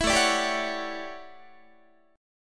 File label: A 13th A down Guitar; A13 strummed; 19,17,17,17,17,17